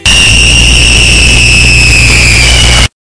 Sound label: screaming